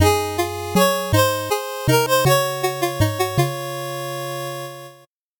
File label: 8bit jingle slow